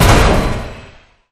safe Lands On Reel; wild wild west

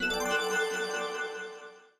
gem Turned On; elements

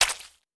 Hit Light Forest; wild worlds

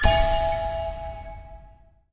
small Win; parthenon

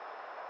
Reel Spin; fruitshopchristmas

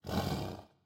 panther fx low; serengetikings

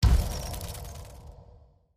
spin start; dracula

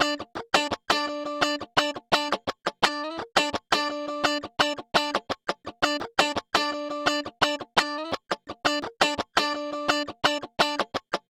Guitar Top; twin spin mw

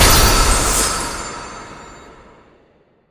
big Win Text Change; jack and beanstalk

